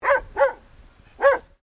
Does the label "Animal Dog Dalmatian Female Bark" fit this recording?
Yes